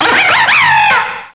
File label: cry; source of the sovereign